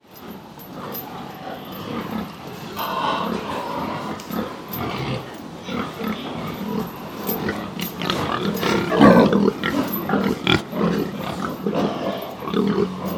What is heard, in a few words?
animals pigs farm intensive texas usa; felix blume